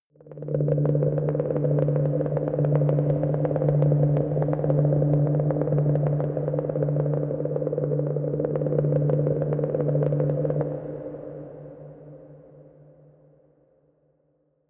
promo pad; atmosphaeren umwelt

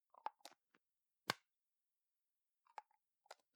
plastic can lid screw close soft long; objekte; dose

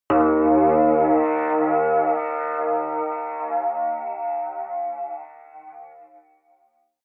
synth hit phase wobble, hits impacts; whooshes rises

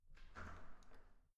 entrance door open soft quick

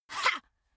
female attack hah; schreie; menschen

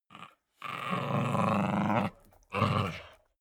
chihuahua snarl fight; natur-tiere; hunde

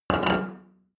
plate place table hard

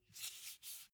car flip key handling

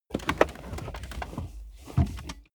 car bmw e46 interior seat adjustment up

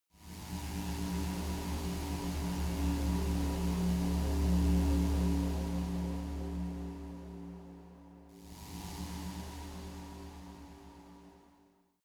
spaceship core; raumschiff

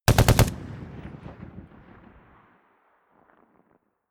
rifle ak-47 exterior fire burst big; Firearms; schusswaffen

